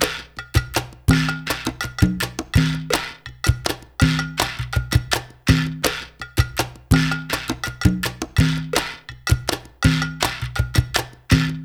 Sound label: Perc, Percussion Latin